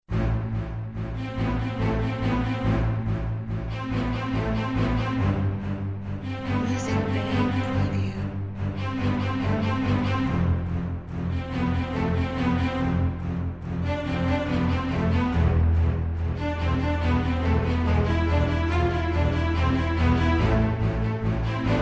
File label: Classical Tension